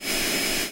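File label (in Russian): grinding of circular saw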